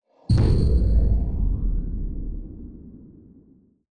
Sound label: epic scene change kick; nova drum unit storm drummer; nova sound